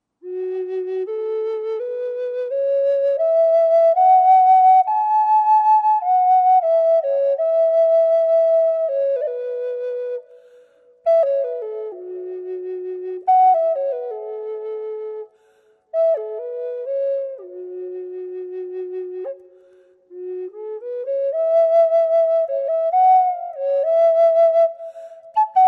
f sharp m, tiger maple bubinga purple heart flutes; standard flutes